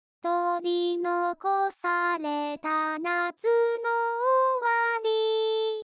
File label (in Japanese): 006（低い）